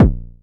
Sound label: Jomox Kick; techno drum fx; Analogue DrumHits